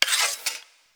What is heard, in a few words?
Choir Bow Load Arrow; Warframe